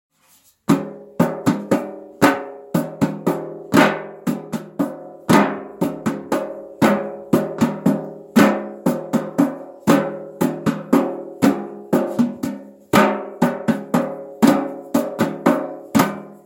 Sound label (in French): Rytme; Ateliers chant